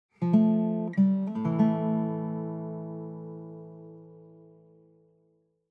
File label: CHORD